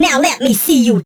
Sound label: Vocal Phrases; Vengeance Samples; Vocals